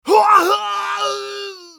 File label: scav death; eft